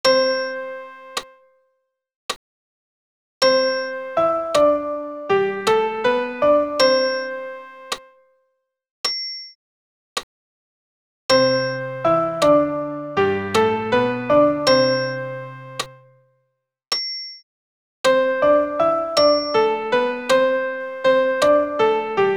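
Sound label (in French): Sanctus soprano piano accompagnement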